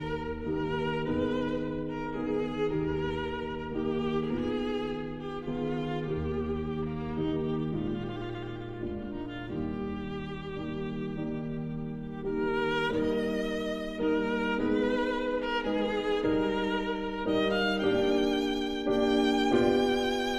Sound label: Sicilienne for violin and piano